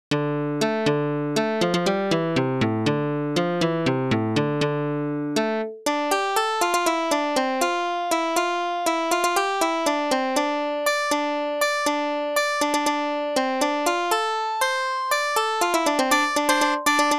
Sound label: medieval synth; ludum dare 40